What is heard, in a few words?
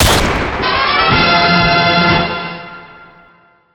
victory